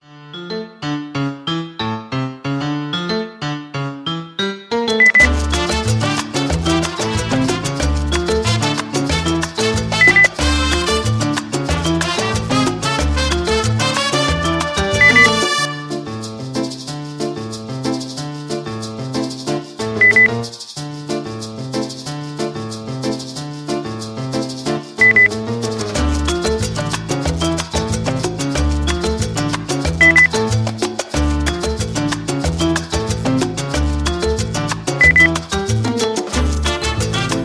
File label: mambo; urlaub